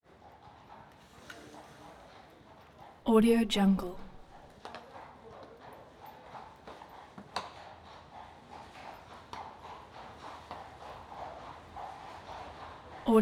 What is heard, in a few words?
horse carriage slow pass by and stop